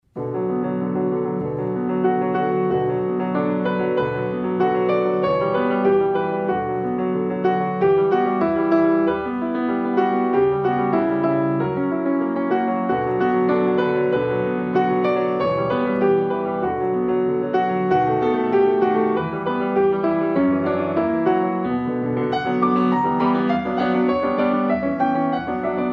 piano seul

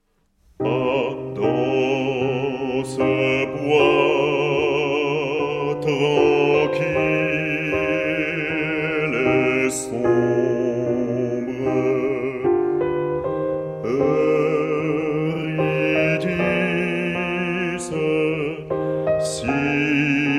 basse; gluck; ah dans ce bois tranquille

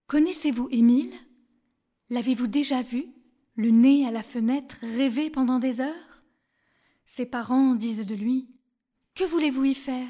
chant piano

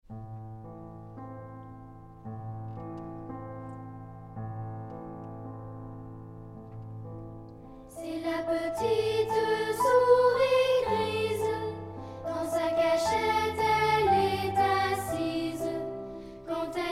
tutti; les petites souris

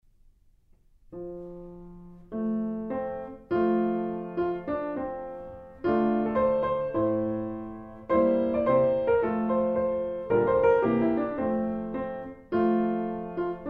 piano seul; nouveau monde 1er mvt

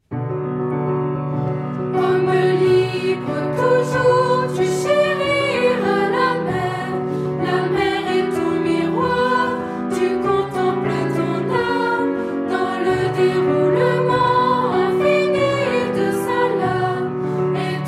piano chant

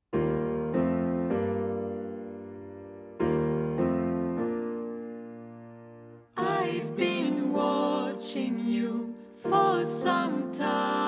tutti

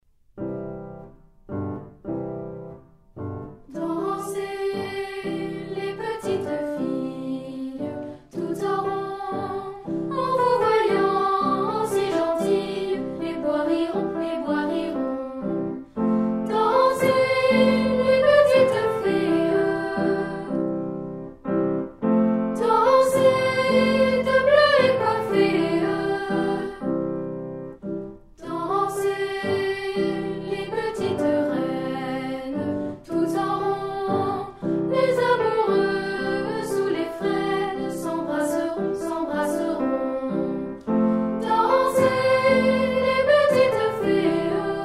piano chant; nouveau monde 3e mvt

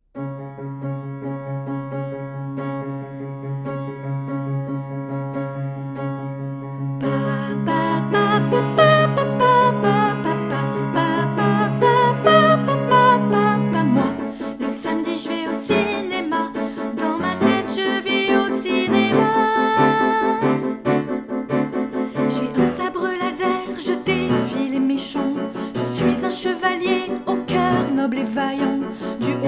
chant piano

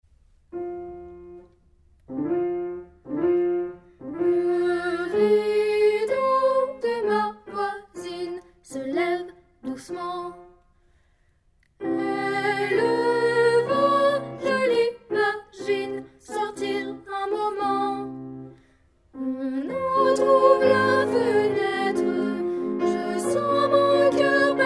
piano chant; beethoven 1er mvt

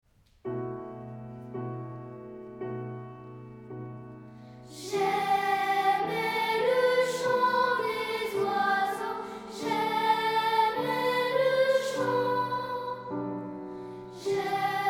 chant

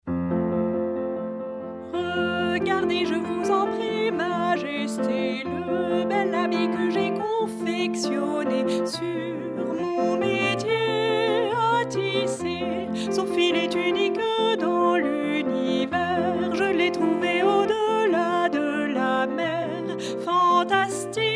piano chant

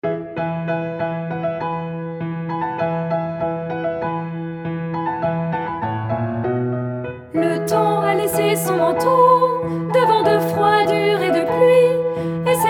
rondeau tutti

